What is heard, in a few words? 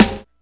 round acoustic snare sound D key; snare drum samples